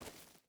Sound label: footstep burlap gravel walk; Rust